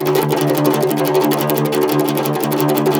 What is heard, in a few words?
CUMBUS Tremolo